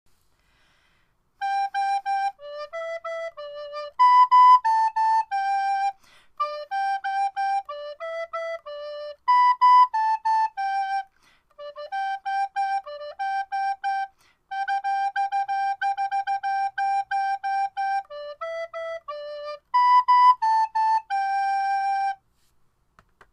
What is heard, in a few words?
old macdonald